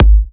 G#, kicks; markus hakala kicks

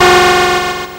Atari Noise Long, Atari 2600

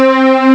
GMC voice; Amiga Soundtracker Sample Packs

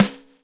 groove; AMG Gota Yashiki Groove Activator

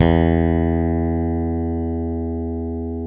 JAZZ, JAZZ BASS

